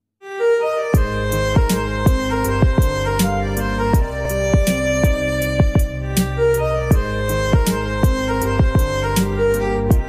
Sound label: flute ringtone instrumental ringtone sad love flute ringtone